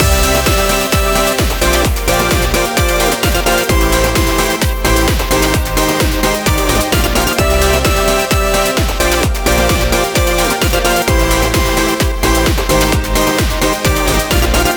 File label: four on the floor